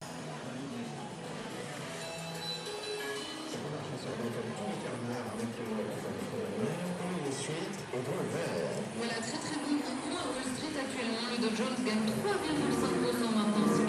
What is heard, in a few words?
radionoise